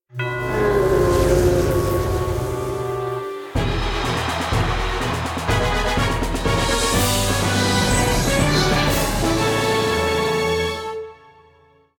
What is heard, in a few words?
bgm intro